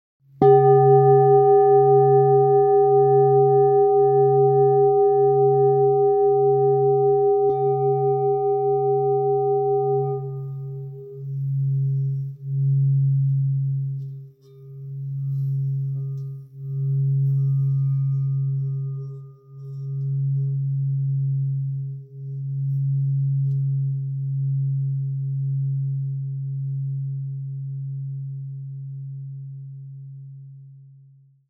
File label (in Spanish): Cuenco tibetano sanador OM 136